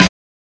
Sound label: Snares